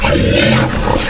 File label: hound jump; quake2; coop; monsters